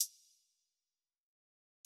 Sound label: HiHats closed, trap drum kit; MZ BEATS - FREE TRAP DRUM KIT